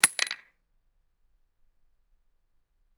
empty shotgun shell casing dropping; bullets

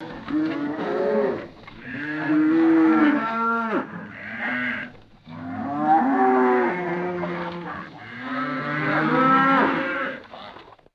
herd of cows mooing outdoors; animals

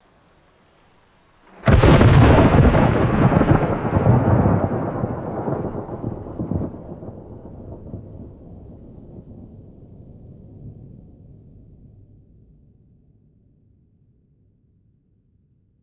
explosive thunderclap with deep rolling rumble

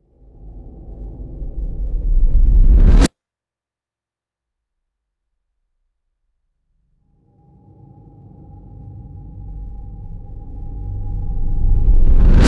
low pitched reversed reverberant airy whooshes

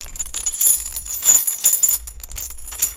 small metal chain rattling and clinking; chains

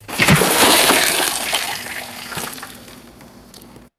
sudden splash from emptying a bucket

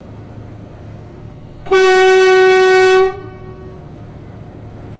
loud container ship horn blasts

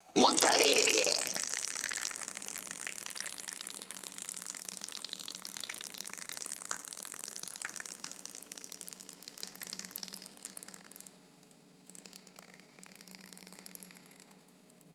gloppy liquid pouring and cereal crackling; water

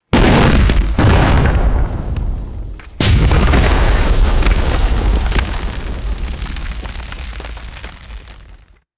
two grenade explosions with falling dirt debris

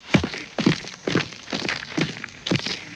wet muddy boot footsteps shuffling; foley

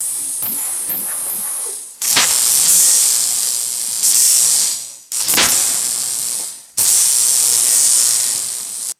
stylized retro cartoon electric sparks zapping; electricity

